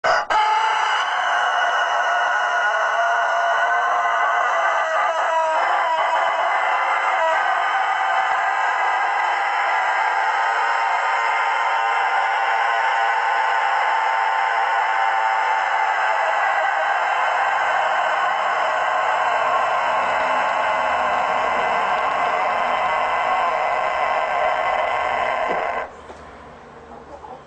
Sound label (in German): Hahn